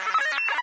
radio random, prison; ambient; source engine; hl2; video games

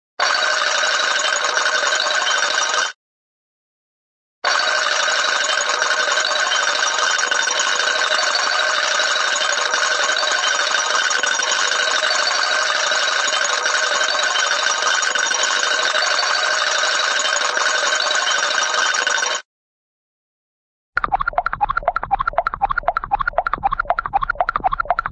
computer, cartoon toy computer running

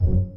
editor rotate; Source Engine; Portal 2

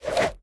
trade up envelope spin

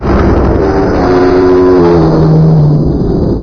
wall move, machines; ambient; Source Engine; video game

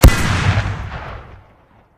grenadier shoot, weapons; tf

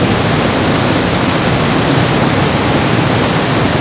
dam water loop; ambient; canals; source engine; hl2; video games

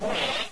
exclamation; sound schemes; windows 98; windows 9x

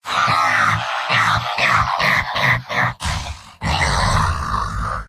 laugher big snort; heavy; mvm

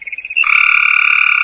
antlion grub stimulated

